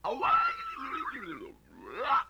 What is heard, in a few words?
LoFi Synthetic Squawk; Vintage Cartoon; Comedy Vocals